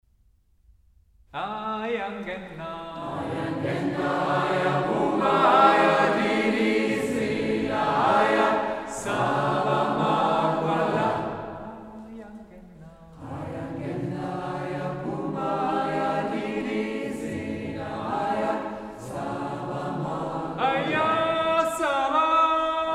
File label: Tutti; Aya Ngena